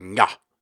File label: Grunts and Chants, Viking Vocal